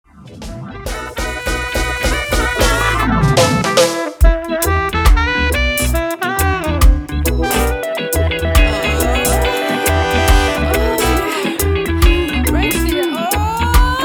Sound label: Baby Oil trombone section; Crucial Massive